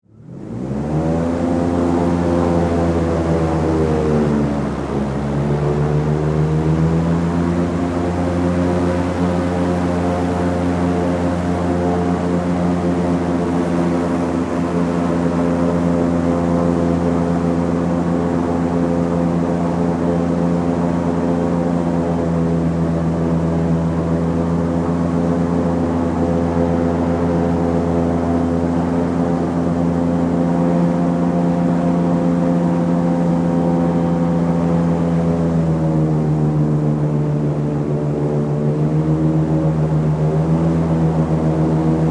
Airboat Run High, Transportation, Watercraft, Airboat, Run, High, Speed, On, Board, Perspective; Blast Wave